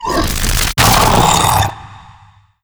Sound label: vox shockwave tell, zombie revived